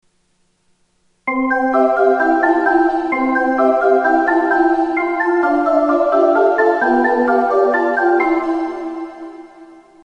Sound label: opening echo